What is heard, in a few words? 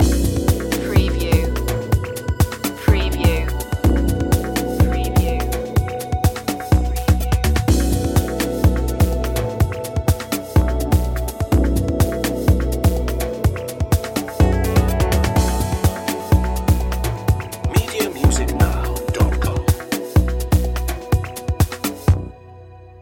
loop, chill